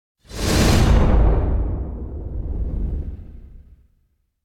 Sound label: Impact Whoosh High Metal Heavy Abstract Sword Strike - Whoosh; Hollywood Edge; sound designer's toolkit v2